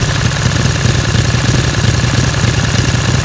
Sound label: mini engine loop; auto; Tony Hawk's Project 8; XBOX